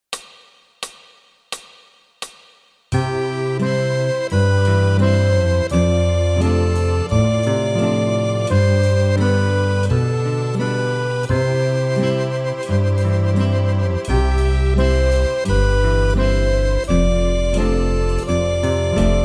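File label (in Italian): Fisarmonica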